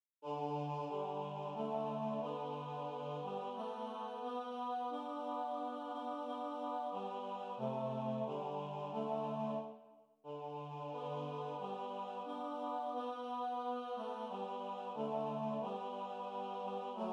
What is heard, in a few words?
Tutti